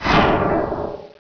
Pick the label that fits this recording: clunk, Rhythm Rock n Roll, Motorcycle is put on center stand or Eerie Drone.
clunk